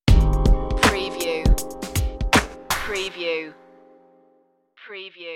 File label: rnb texture stinger